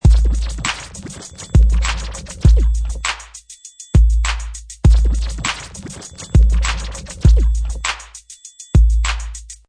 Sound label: Scratch Fast beat 100, Vinyl Fast Scratch 100 bpm with beat, Professional killer vinyl scratch on top of hip hop beat; Dj Sound Effects